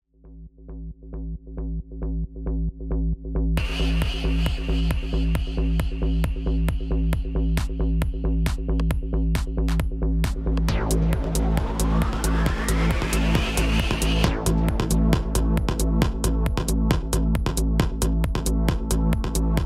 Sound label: Techno Trance